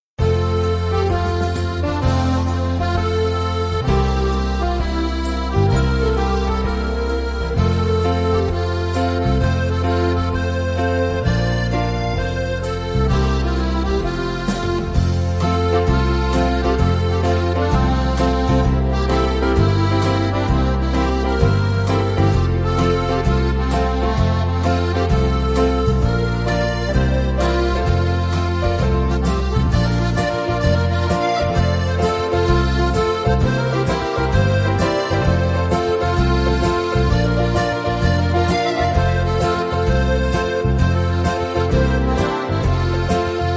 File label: accordion dance